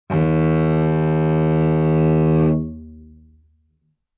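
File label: Cello arco fortissimo sul C Eb2; MIS Pitches - 2014; Strings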